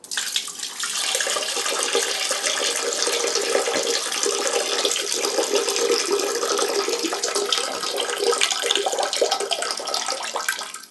water pouring

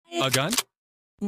gun

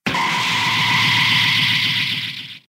shock

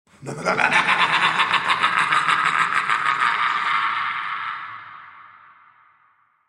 evil laugh funny

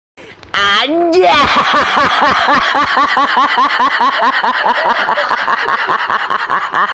anjay haha

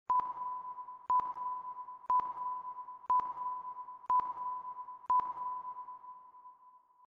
countdown 5 sec

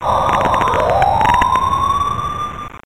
disaster; simcity dos

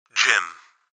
button; stanley parable jim

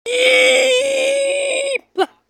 eep

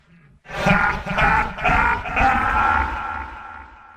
laughing; metal sonic

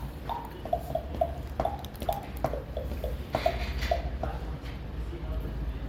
beats

